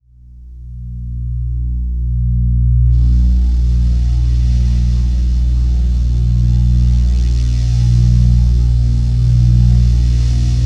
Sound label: Hum & Buzz, Electronics; Sony Pictures Sound Effects Series Volumes 1-10; Sony Creative Software